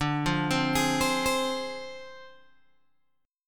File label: D Minor 7th Flat 5th Guitar Open G